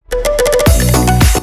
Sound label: sms